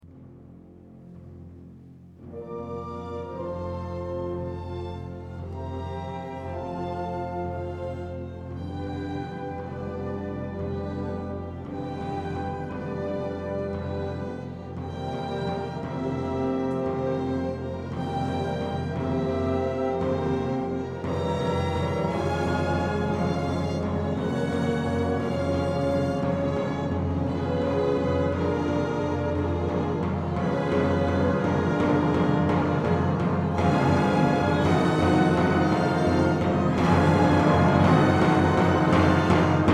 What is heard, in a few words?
choir singing; brahms; denn alles fleisch